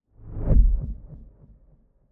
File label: whoosh pointer